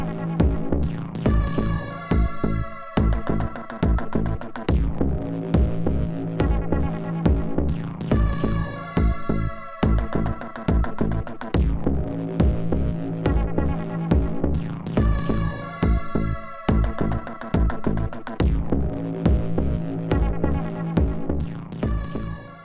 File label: zombie